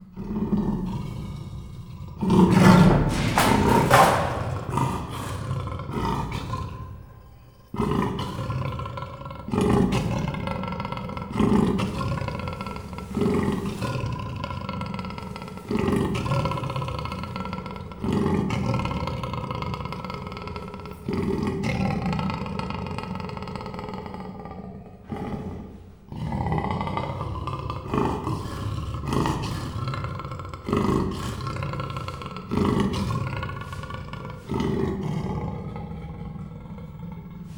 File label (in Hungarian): igazi hörgés, perzsa leopárd; miskolci zoo 2018